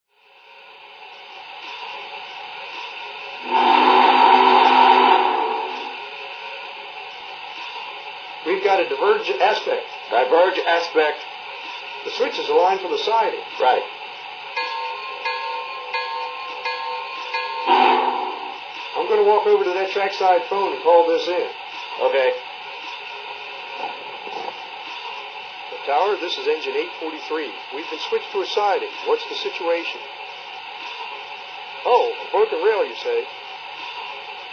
freight

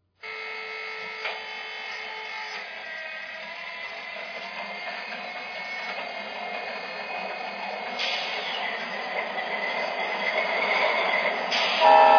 dash8, electric trains; nasa

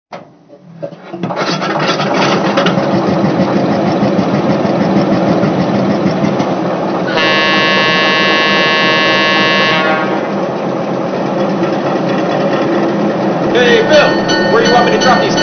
GE 44Ton, electric trains

